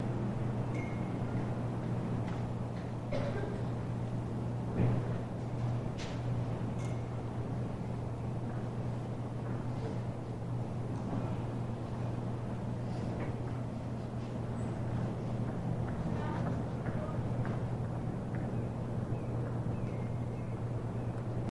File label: School Courtyard Amb, crowds ext